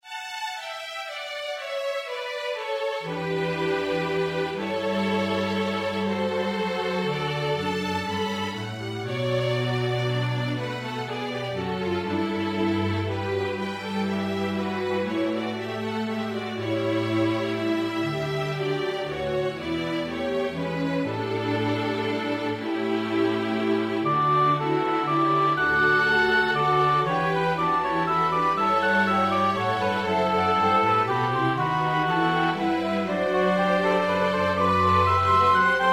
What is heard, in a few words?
baroque concert for flute and oboe minor; antonio tudura